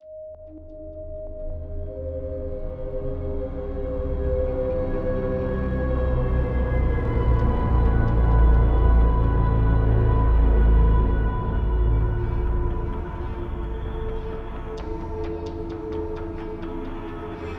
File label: Atmosphere Mines